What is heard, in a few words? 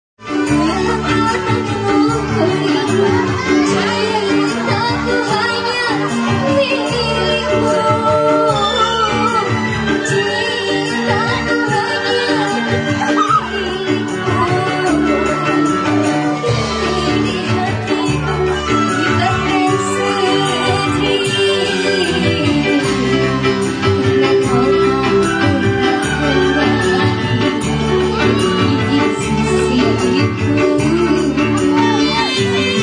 Music Chinese Pop Song Performance; Environmental